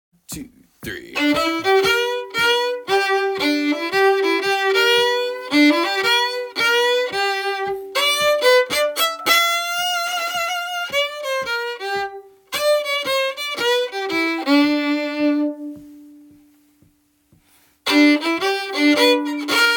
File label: solo